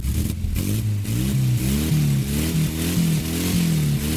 Engine

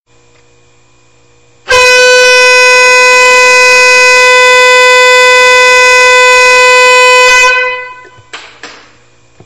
Train Alarm

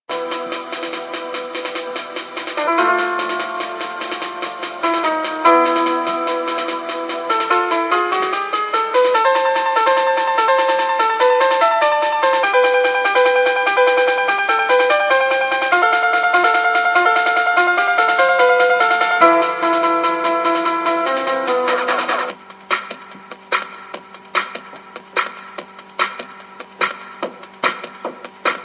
Punch Out Remix

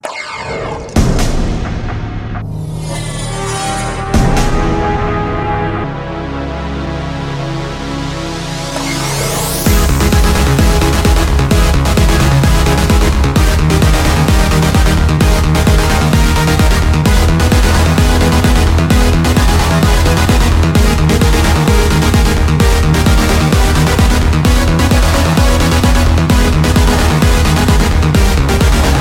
Trance

